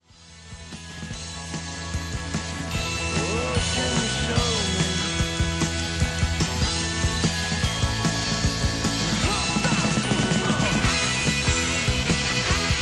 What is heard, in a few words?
solo, saxophone